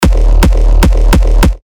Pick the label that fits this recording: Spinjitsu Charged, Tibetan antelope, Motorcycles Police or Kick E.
Kick E